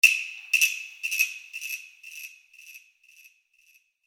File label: wood